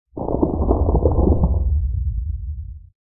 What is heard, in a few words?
Rumble hot; Fight Knight